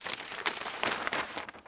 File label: paper, SHOP; AMBIENT; Pirates Of The Caribbean